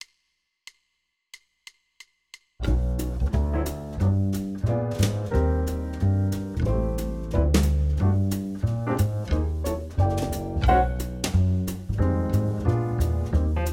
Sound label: Jazz Etude; track only; Etude #7 track only